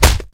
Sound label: boxing hit